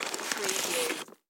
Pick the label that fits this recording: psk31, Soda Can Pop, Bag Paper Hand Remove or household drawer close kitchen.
Bag Paper Hand Remove